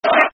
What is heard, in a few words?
Come On You Irons, Football Chant; West Ham WHFC